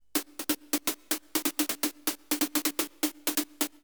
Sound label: SNARE HOUSE 125, Drum; eJay